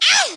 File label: ow